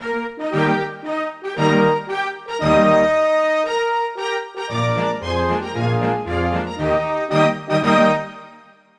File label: Anthem Cuba; Buzz! The Mega Quiz; PS2